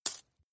coin sound; Caravan Beast

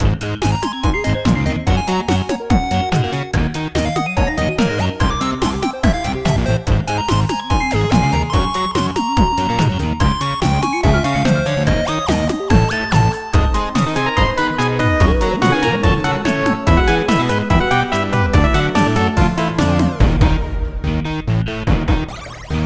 Main Loop; Circuit Dude

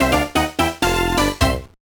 Jingle; Elevator Action Old & New; GBA

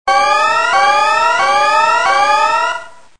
Alarm; Black Navy War 2

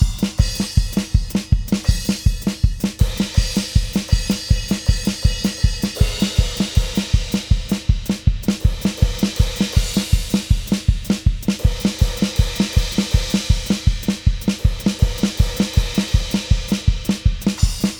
Music Body Perc; GnomeGnight

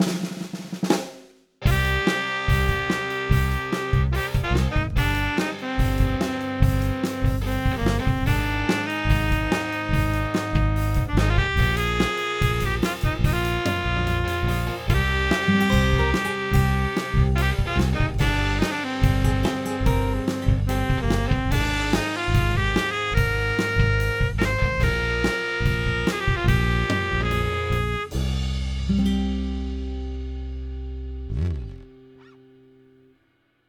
Wellworn Fanfare; Golf Story

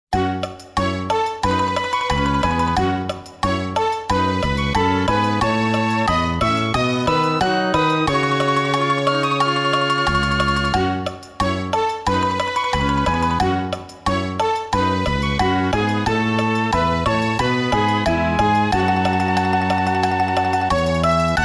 Happy Heroine; Chaos Seed: Fuusui Kairouki

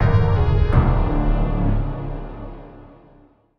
Mus Invasion Fail; Space Tyrant

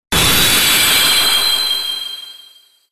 battle critical; Localdia Chronicle; Android game music

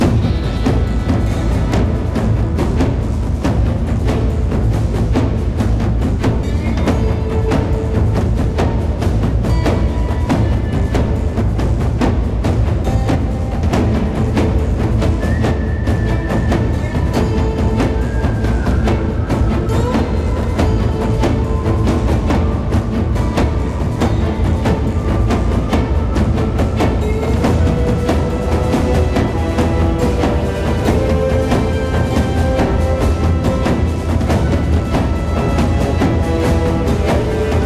Med Threat Loop; Route99

